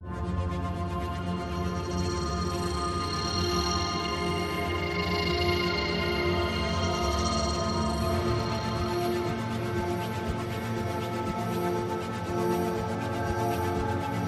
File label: Oratorio Drone Full